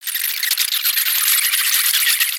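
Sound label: Pets Insect Large Rage; creatures; Lego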